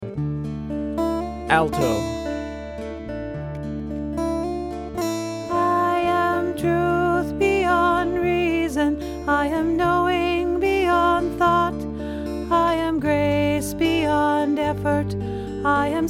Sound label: A Prayer Beyond Alto; 2023 Fall Repertoire